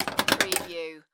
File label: Pinball sound, ball moving on rail and hitting several times